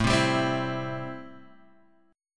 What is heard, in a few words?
A-Minor 7th A; x,x,x,2,0,2,2,1; Am7 strummed; JGuitar